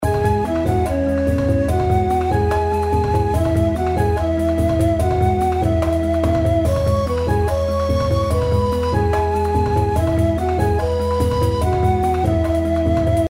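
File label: intro background music, origin of fire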